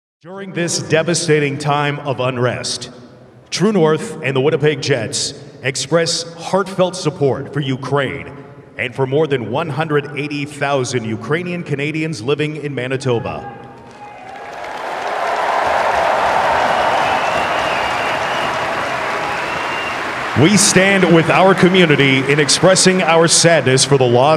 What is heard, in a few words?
Ukrainian National Anthem Ukrainian Male Chorus